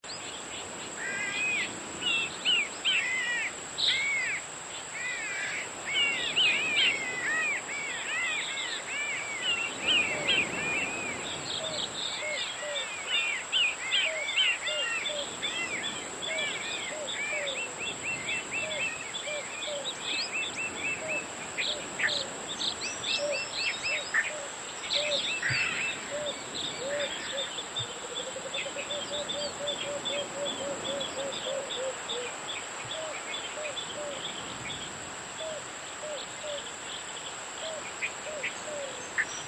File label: Guam Birds